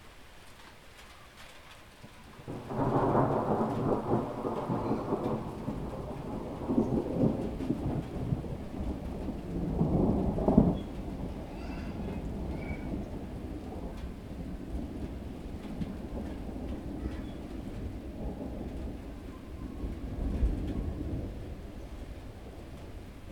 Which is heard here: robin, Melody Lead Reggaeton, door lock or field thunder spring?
field thunder spring